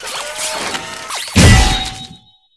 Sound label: kick, combat; kick sounds